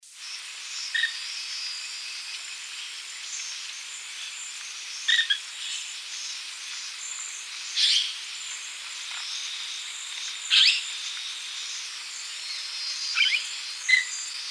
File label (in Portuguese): canto da curica de bochecha laranja